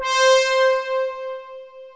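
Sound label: analog trumpet, synth pad; InVision Interactive; Lightware VOL-1; Instruments & Percussions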